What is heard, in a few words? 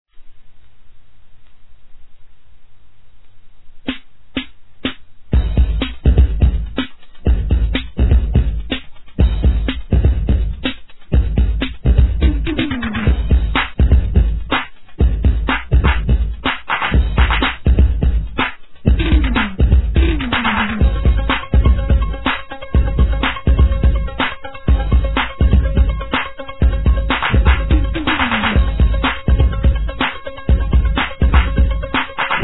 dub